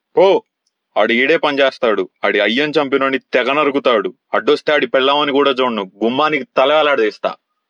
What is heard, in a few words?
angry